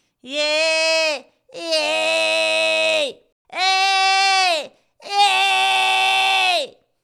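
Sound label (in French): BV twang, Distorsion bandes ventriculaires; les effets simples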